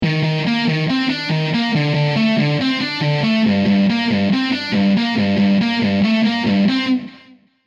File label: Gm 160, Rock & Metal MIDI Melody; External